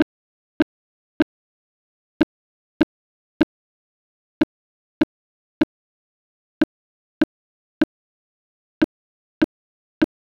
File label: Clarinet vs Violin